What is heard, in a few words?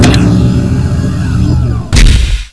MAZE DOOR